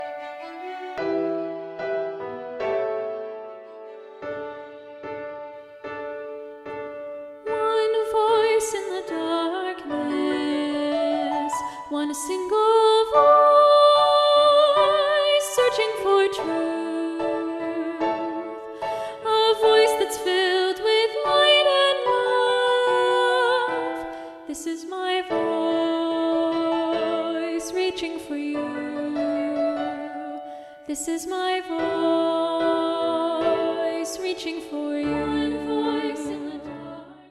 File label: one voice solo vocal example